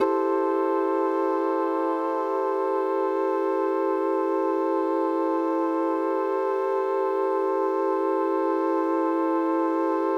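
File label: Mixed Winds A minor, synth orchestra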